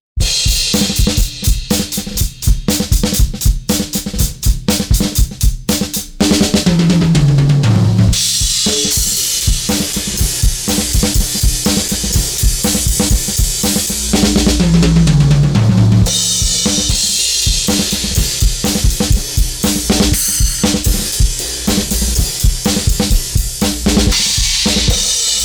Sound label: EQ High Shelf boost; Kirchhoff